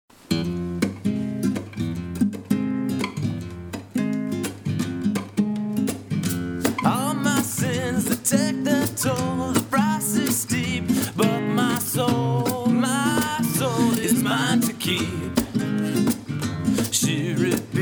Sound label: Swamp Rock